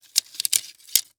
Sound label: Sports Pole Plastic Tent Poles Moving Around